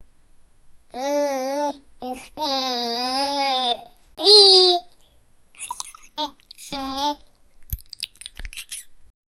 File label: mmm yum yum satisfied sou; eating